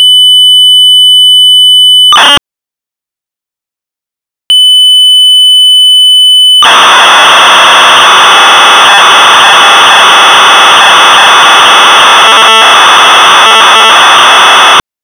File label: SOUNDER